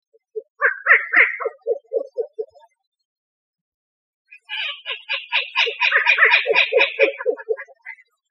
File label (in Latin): strix rufipes